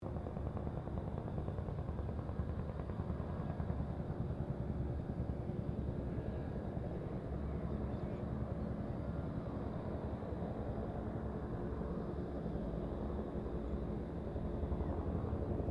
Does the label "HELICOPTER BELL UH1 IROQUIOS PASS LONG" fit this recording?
Yes